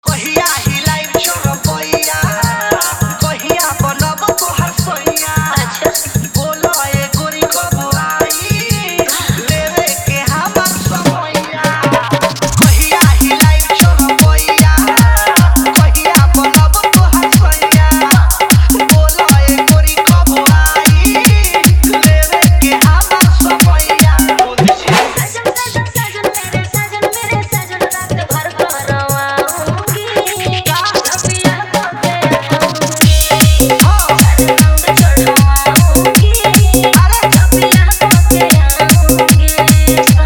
Bhojpuri Dj Song Dhollki Bass Mix

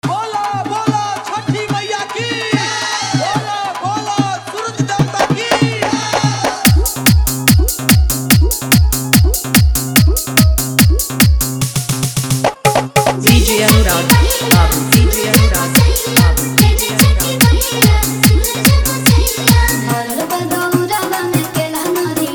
Bhojpuri Chhath Geet Mix

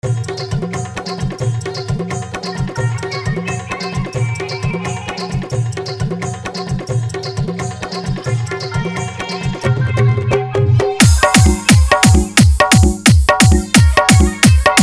Bhojpuri Hard Bass Mix

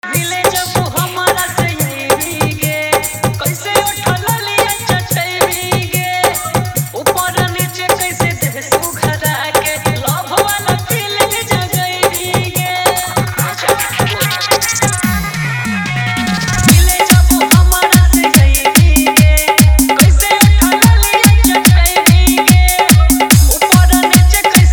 Fully Dhollki Bass Dance

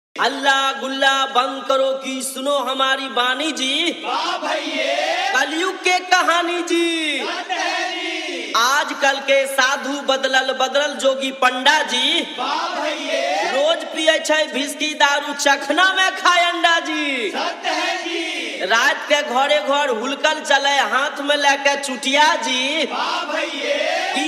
Holi Dj Remix Song Bhojpuri

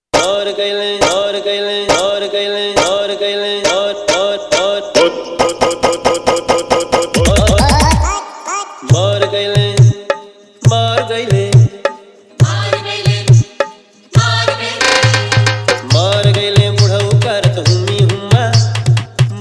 Holi

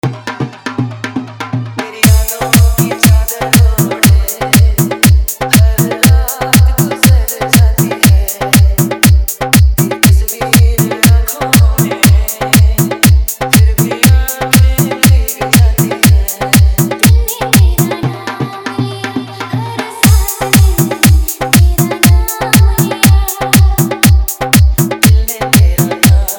Hard Dhollki Mix

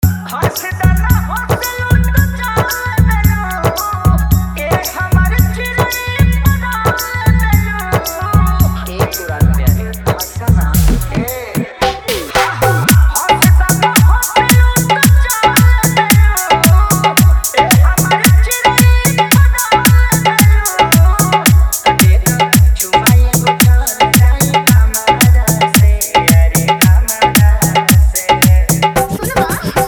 Bhojpuri Dj Song Hard

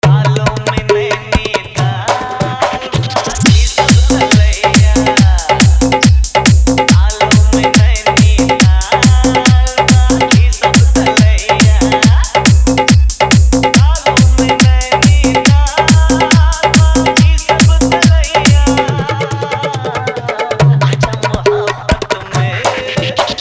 Hindi Hard Dhollki Bass Mix

